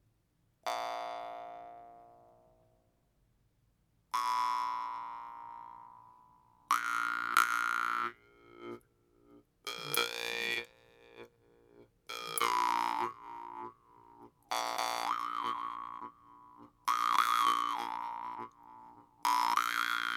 TCHEQUIE BASSE A, guimbardes

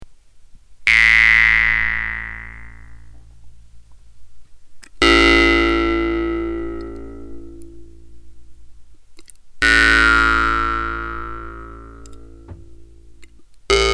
tatare basse, guimbardes

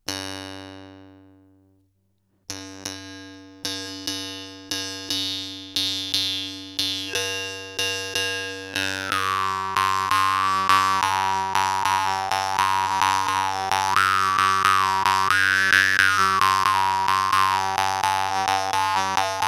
brook F3, guimbardes; volgutova

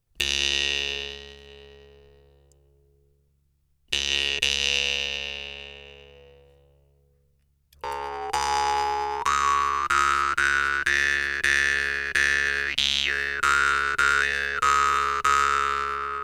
noreev tuva C4, guimbardes

